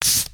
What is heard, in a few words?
Compressed Air